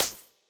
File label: hit sounds; Illusion UE5